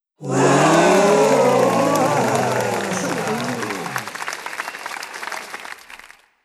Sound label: Crowd Laughs And Reactions; people; applause